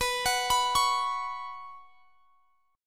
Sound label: B Suspended 2nd B; Bsus2; arpeggiated; x,x,21,23,24,21